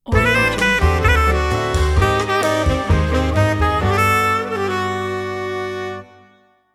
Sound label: fresh bossa nova logo